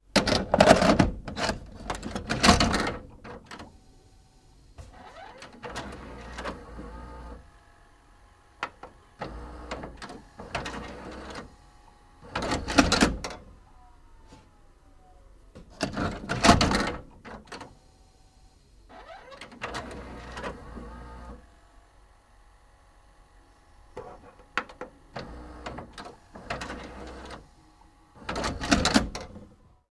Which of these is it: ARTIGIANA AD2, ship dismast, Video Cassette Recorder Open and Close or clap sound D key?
Video Cassette Recorder Open and Close